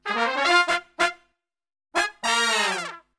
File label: POP BBM, Brass; Best Service; Brass Super Section; POP LICK